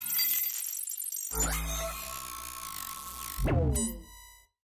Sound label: analyzing